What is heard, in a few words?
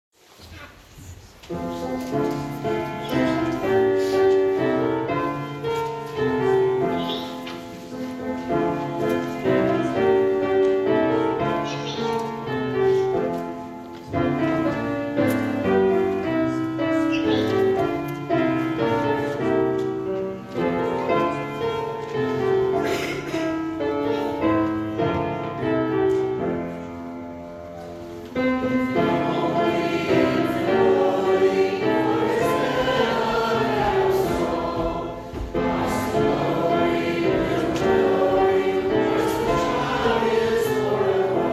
Hymn; Infant Holy Infant Lowly; Holy Hilarity Sunday April 16 at Mt. Zion